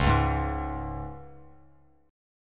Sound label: C sharp 5th, Bass Standard; strummed; C#5; JGuitar